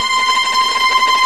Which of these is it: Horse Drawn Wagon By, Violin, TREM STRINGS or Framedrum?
TREM STRINGS